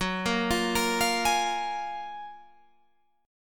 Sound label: F sharp Major 9th F sharp, Guitar Open G